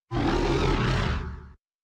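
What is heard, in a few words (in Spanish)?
"Grito de Grimmsnarl", Pokémon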